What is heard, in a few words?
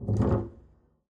pizz noise strum long, double bass; dsmolken